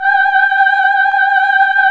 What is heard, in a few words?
choir